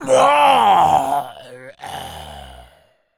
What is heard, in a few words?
Death, Zombies; zps; Carrier